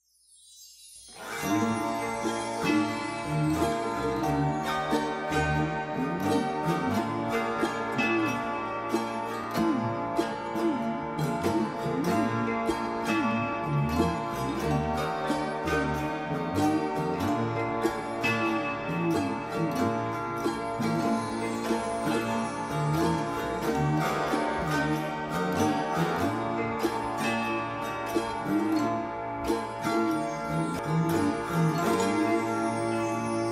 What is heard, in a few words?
xylophone track